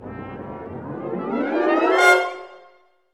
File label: BRS RIP, LOW BRASS; Roland - LA Composer vol. 1